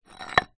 rock or stone movement drag then drop concrete ground; foley